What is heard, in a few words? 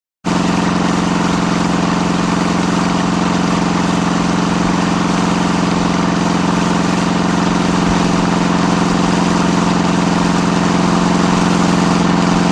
Large Diesel Engine; Running Steady; Running Steady, Medium Perspective.